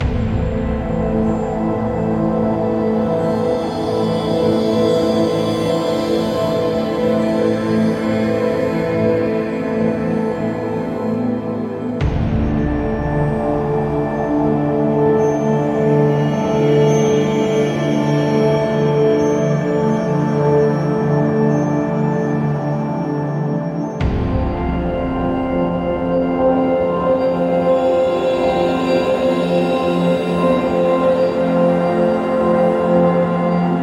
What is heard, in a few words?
Industrial; mechs mercs black talons linux macos windows gamerip 2015